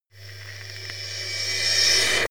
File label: reverse hit grainy high